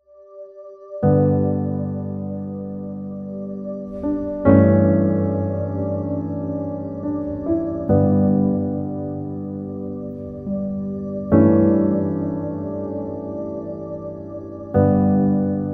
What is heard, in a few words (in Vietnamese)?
(Instrumental) (OST)